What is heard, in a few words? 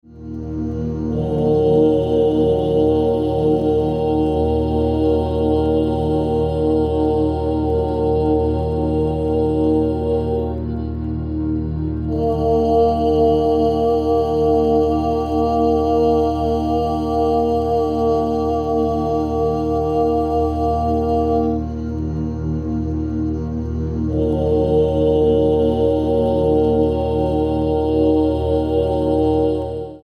Om Meditation